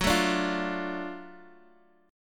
F sharp Minor Major 7th Sharp 5th, Guitar Half Step Down